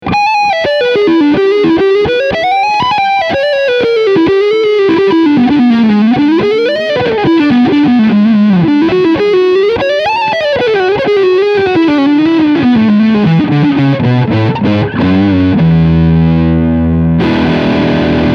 Leadsound Front HB

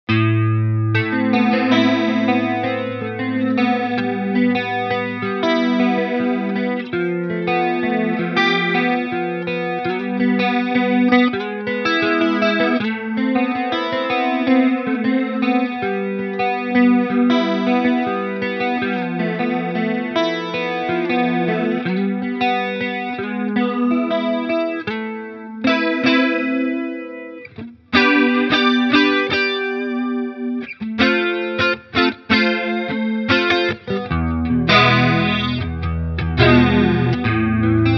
PRS Chorus nur LFO 1; Wind through the trees

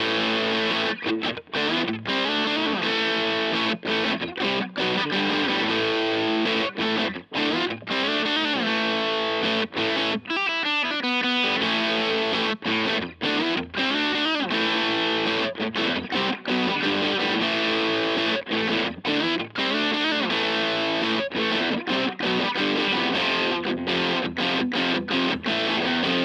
DC 60 Junior Zerre; Harley Benton

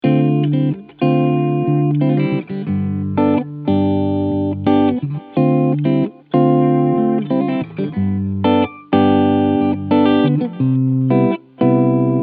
Aeolus Cleansound beide Humbucker; Harley Benton

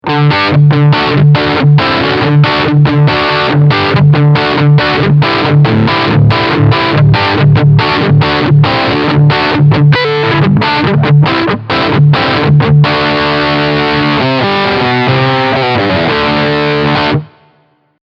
Riff Overdrive beide PUs

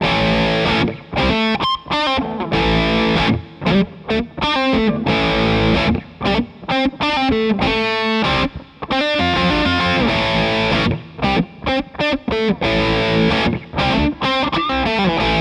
Ibanez RG Gain Neck Zwischenposition

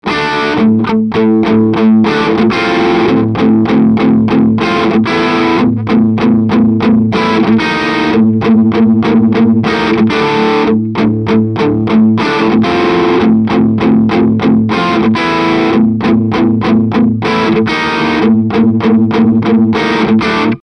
Boss DS 1w Custom Tone 10 Uhr Dist 14 Uhr

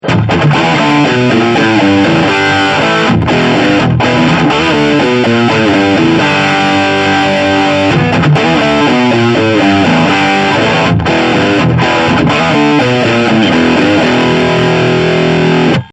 MXR Vergleich GT OD Low

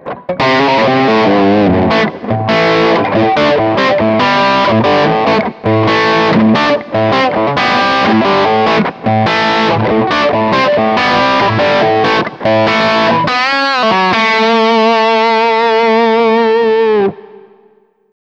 Baltic Blue Fuzz 9 Uhr Steg Humbucker

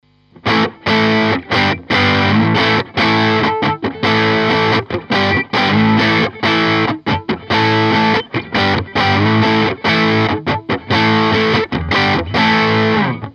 EQ Mitte More Gain Strat Hals PU

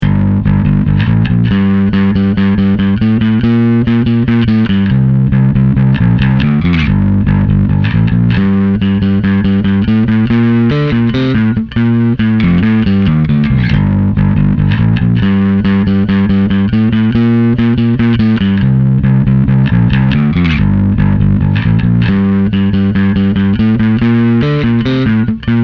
klangbeispiel 2 finger

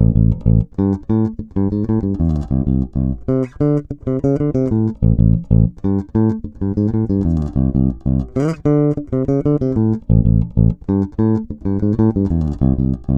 finger aktiv

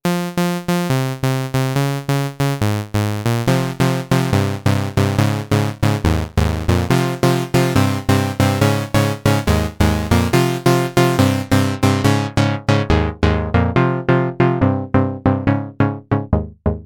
chordsequenz

